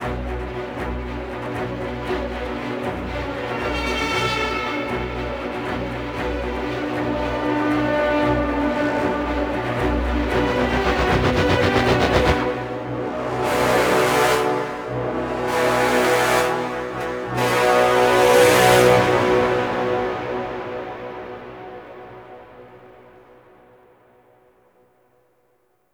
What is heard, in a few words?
Galleon Boss Finisher Music; Warframe; Rail Jack; Grineer Galleon Boss; Ehra Rok